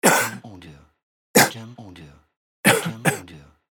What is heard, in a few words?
Cough